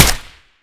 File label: fire 762 sup, mk23; ARC9 uplp fesiug